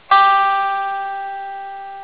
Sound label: Sound Guitar G